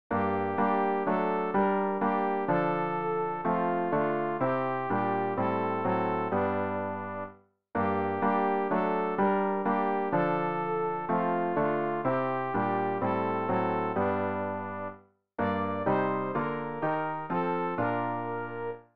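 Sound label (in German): sopran, Übehilfen für das Erlernen von Liedern; mit ernst o menschenkinder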